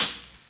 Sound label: Hi-Hat Sample G# Key, closed hi hat